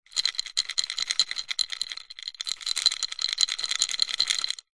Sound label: food savory snack wasabi peas in ceramic bowl shake; zapsplat